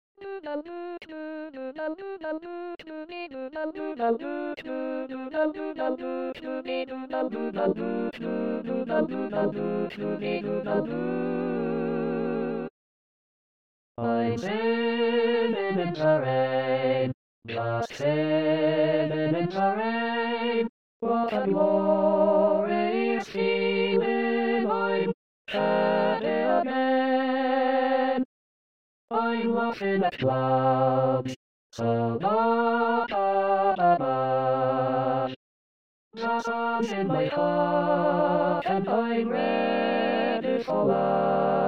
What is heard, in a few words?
SATB